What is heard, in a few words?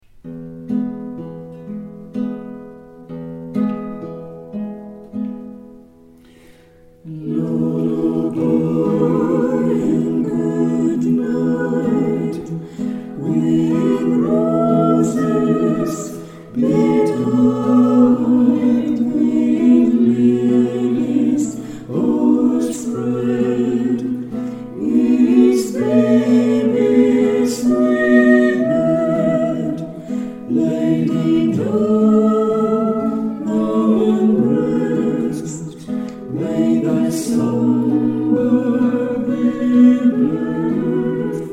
Lullaby, campanari